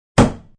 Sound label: Table Hit, Open hand smack down on wood table.; Open Hand Table Hit 1; Hitting; Human